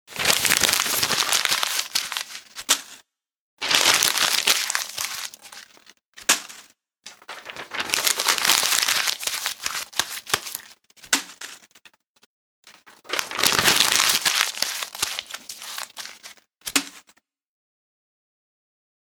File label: Waste Paper, Screwing up paper and throwing into a bin